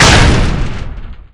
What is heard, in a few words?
door stop, device old; Soundscape Overhaul